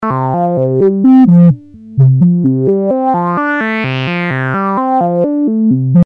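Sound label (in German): Dioden-VCF, Low-Pass, Modulationsquelle jeweils LFO; modular synth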